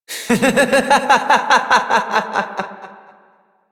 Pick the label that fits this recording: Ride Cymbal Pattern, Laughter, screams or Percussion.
Laughter